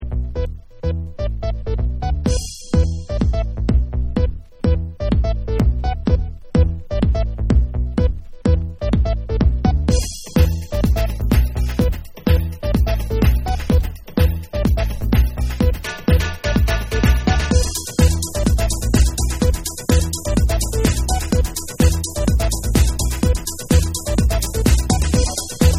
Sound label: Electro Pop Mix